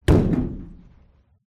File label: vent crawl hand impact; Poppy Playtime; Huggy